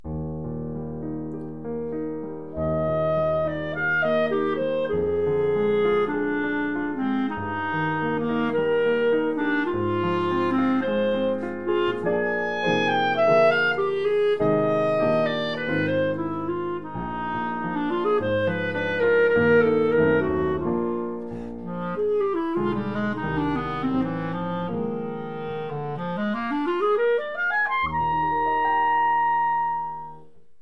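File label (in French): brahms, clarinette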